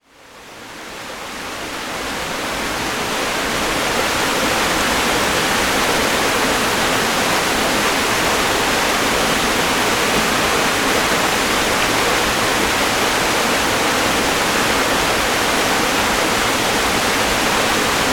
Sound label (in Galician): Exterior Auga Correndo